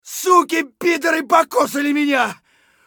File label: scav wound; eft